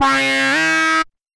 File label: Beat